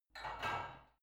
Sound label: barbell only replace unsteady reverby soft